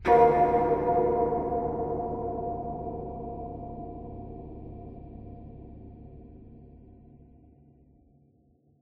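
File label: music ambient; Perception; PS4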